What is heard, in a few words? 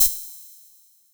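Vermona DRM2 hi hat open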